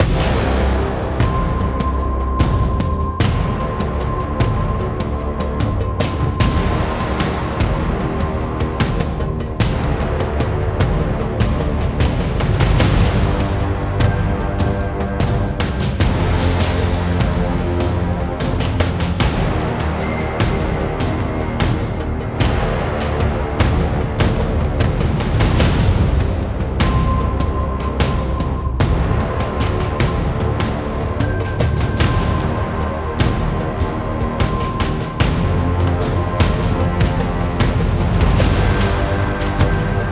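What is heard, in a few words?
Music Percussion, Percussion Pre Race 75bpm; Music Perc 3#10 (Pm Perc Pre Race 3 75bpm Game Mix; Forza Motorsport 6 Apex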